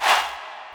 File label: Vocal Crowd Hey; Ableton Live 12 Lite; Core Library